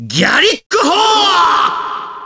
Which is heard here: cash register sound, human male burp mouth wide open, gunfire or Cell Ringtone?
gunfire